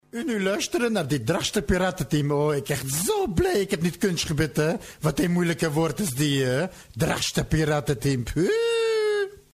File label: Jingle; Audio Fragmenten; Arkan Radio 2